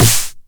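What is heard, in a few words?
909 SD Tape, snare drum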